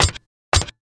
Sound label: Corps walk